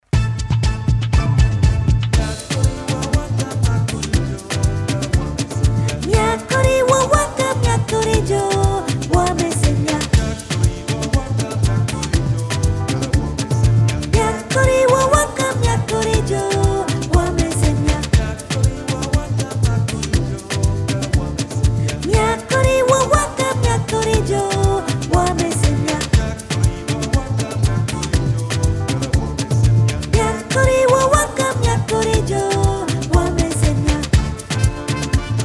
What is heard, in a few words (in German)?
Sopran